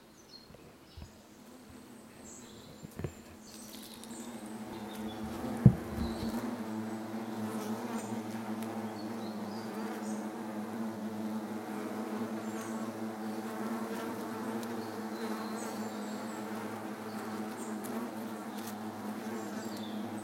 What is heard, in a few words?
Beehive; France - Beehive in Saint Vite